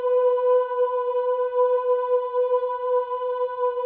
CHOIR OOS, B4; Spectrasonics; Symphony of Voices

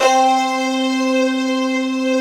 ZAP STRING; VFX-SD #7

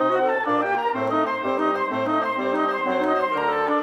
Winds, Orchestral; Sonic Foundry (Sony Creative Software) - Orchestral VOL-2

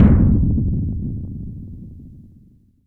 Boom, Roland JX-305

